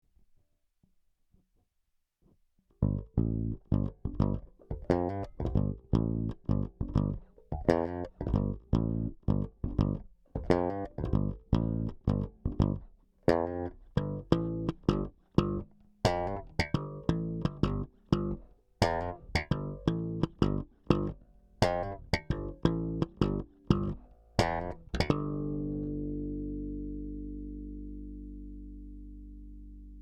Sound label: bad comp too long release; Kompressoren